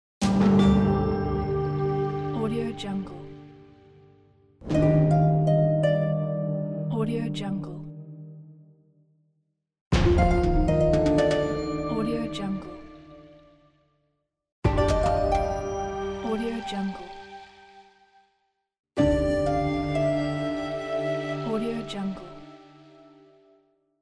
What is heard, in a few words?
antique greece pack; tibasound